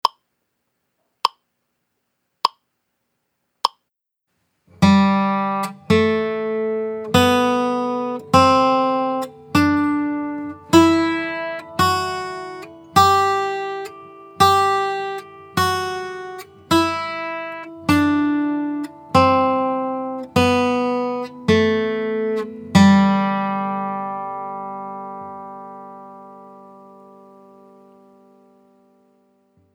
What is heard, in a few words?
G Scale